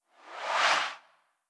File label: UI FX BG Scroll; blue archive